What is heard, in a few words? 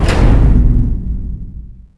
Table Raise end; theed; XBOX; Star Wars Obi-Wan